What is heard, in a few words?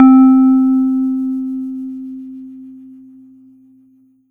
Yamaha PSR-22 Elec Piano C4